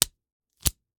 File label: Slap bracelet, smack, slap, whip, felt, plastic, hit, hold, continue; Eiravaein Works; Vaeyan IV